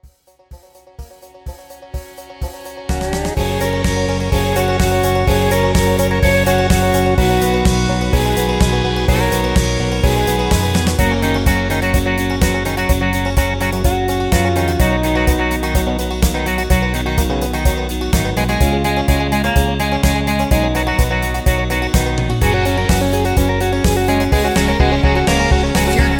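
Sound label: Instrumental With backing vocals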